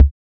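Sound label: bass drum, analog, KPR-77; Sound & Vision - Gigapack I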